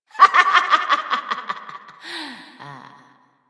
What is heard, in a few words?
Laughter